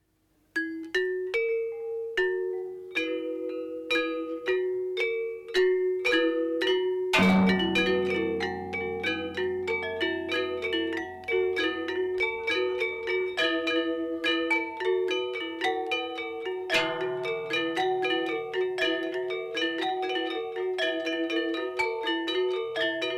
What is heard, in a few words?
Asak selunding Rejang Sanja; 1972